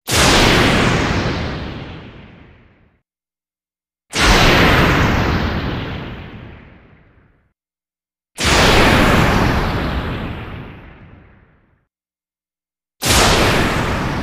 Explosions, Processed Through Vocoder For Underwater Effect 4x